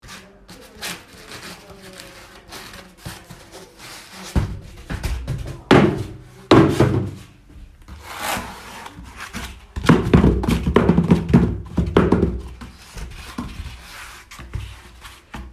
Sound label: Cylinder sound